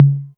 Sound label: tom mid, Novation Drumstation